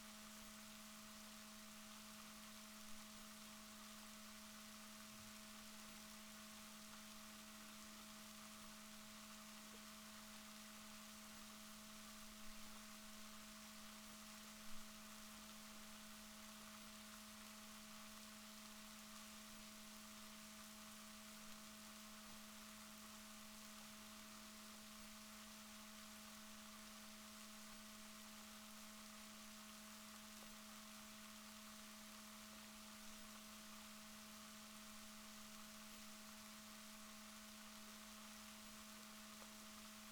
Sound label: rorquals negatif, Balaenoptera Physalus Chile